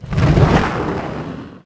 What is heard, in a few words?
Fire Projectile; projectiles; Max Payne